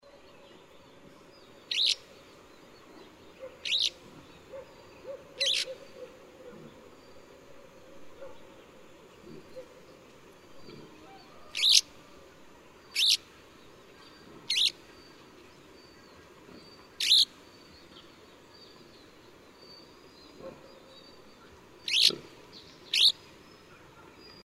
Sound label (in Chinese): 洋燕; 燕科/留鳥